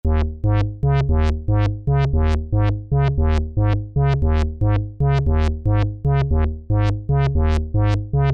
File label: modulations